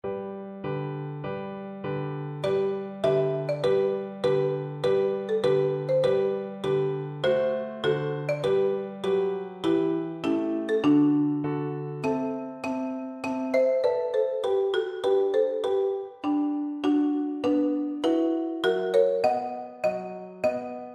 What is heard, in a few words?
bizet carmen, percussion